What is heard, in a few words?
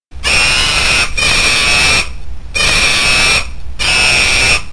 monkey loud